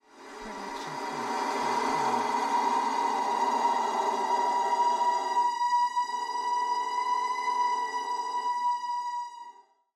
Screamy Screetchy; soundscrate

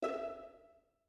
note high pizz

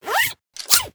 zipper fast

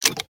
gun check; soundscrate

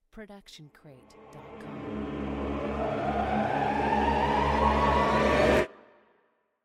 choir swell; soundscrate

